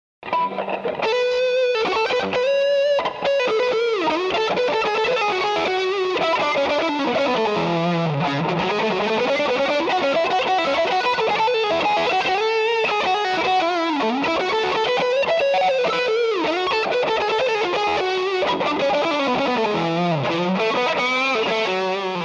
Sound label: solo